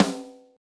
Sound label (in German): snare drum fett klingen lassen eq; Mixdown Tutorial: Snare Drum anfetten